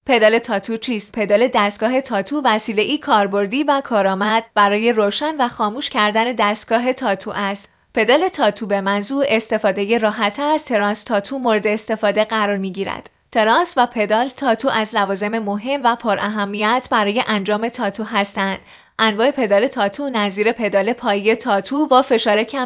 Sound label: tatto pedal